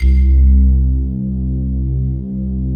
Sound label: PAD, Pads; Best Service; XX Large